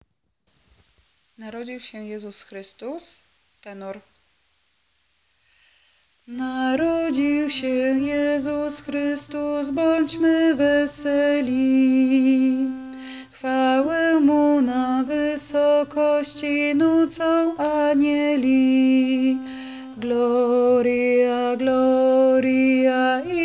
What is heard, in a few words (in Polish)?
Narodzil sie Jezus Chrystus tenor; Boze Narodzenie